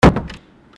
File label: door